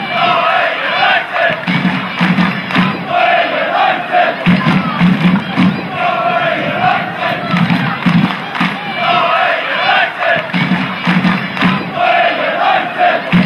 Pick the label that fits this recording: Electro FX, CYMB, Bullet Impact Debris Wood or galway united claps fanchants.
galway united claps fanchants